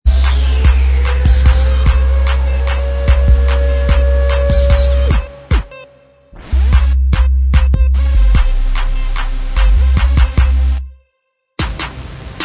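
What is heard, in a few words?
instrumental, backing tracks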